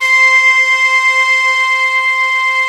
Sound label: army brass C5; US ARMY; Synth